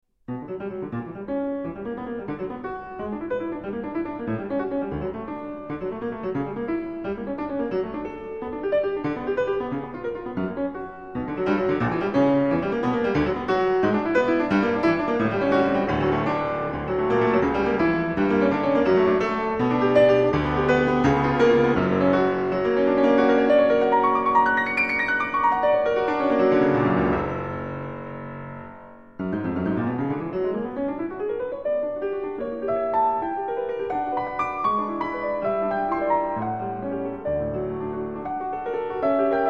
nocturne pour piano in B, vivace; FAURE (Gabriel)

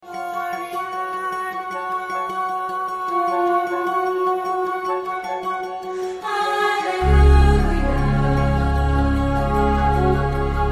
theme serie tv, chor

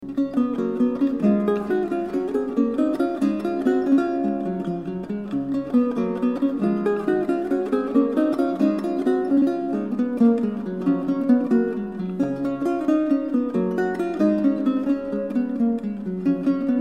guitare; GUERAU (francisco); poema harmonico; Canario

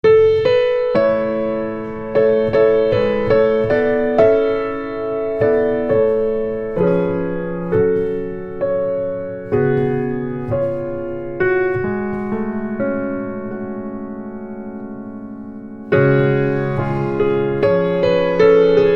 hymne nationnal ukrainien, piano et ch; VERBITSKY (Mykhaylo)

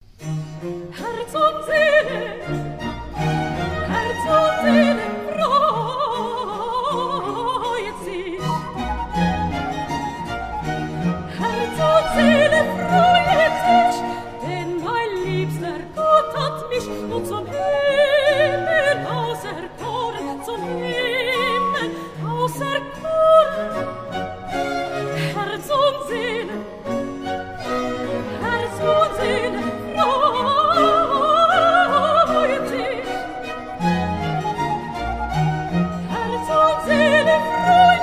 cantate, aria sop; BACH; bwv 151

